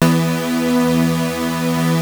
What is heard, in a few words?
Prophet 5, B3; Hollywood Edge - Giorgio Moroder Rare Synthesizer Collection